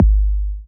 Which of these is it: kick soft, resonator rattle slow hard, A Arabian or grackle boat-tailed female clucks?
kick soft